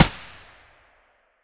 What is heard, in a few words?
aps muzzle distant; STALKER; IWP